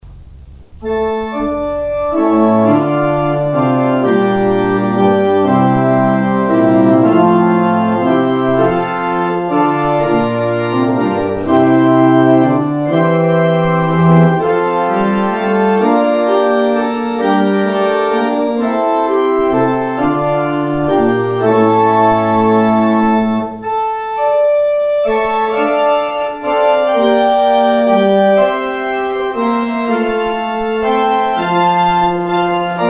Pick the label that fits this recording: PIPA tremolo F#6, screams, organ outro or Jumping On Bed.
organ outro